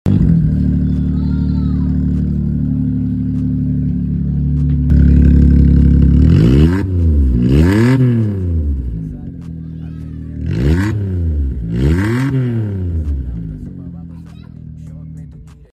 daewoo matiz

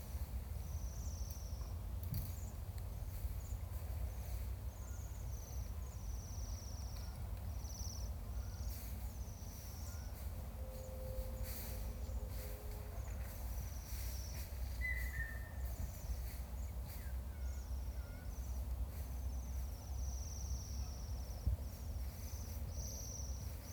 Bohemian waxwing; پرنده بال لاکی بوهمی

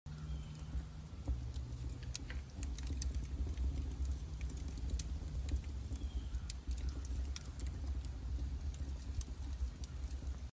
Wood Fired Oven

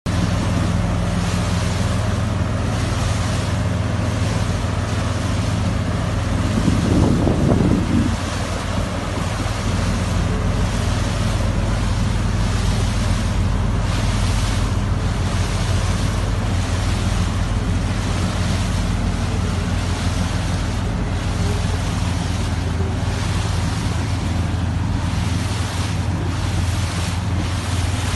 lenj, لنج

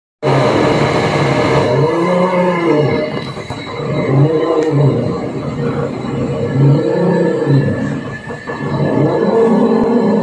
ferris wheel; چرخ و فلک

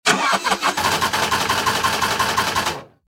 car does not turn on

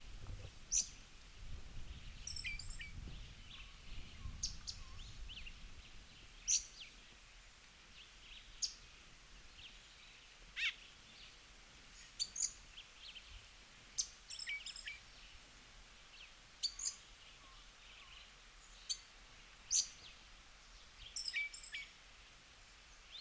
Western red legged thrush

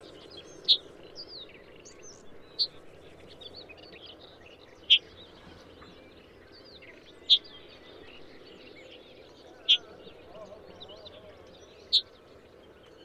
Red headed Bunting Emberiza bruniceps

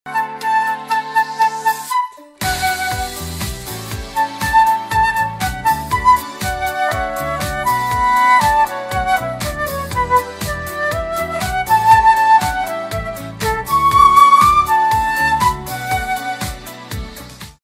Piccolo

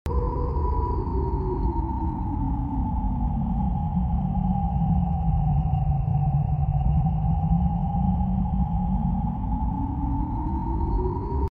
wind in desert; زوزه باد در بیابان

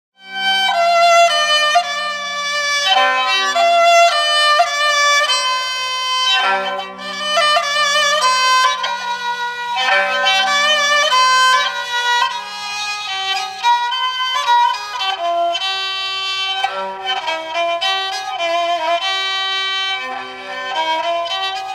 fiddle; کمانچه

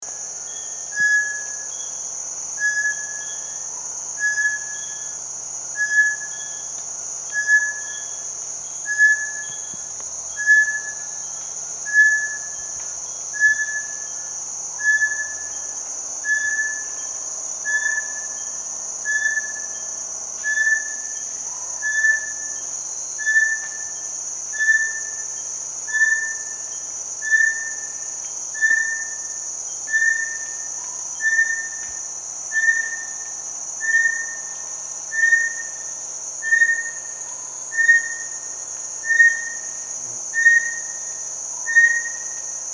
Bartlett's tinamou

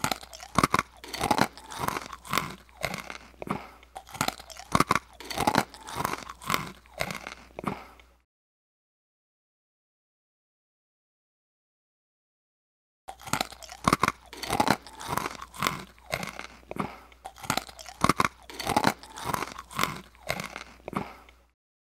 eating pretzels

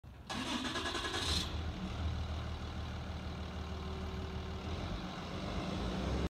school bus

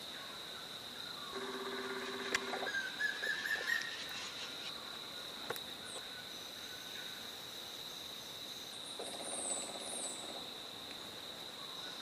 Ringed woodpecker

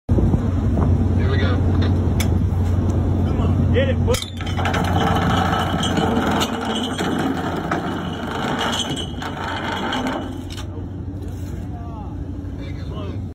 ship brake

